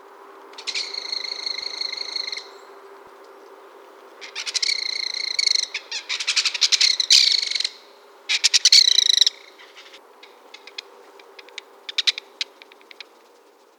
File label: belted kingficher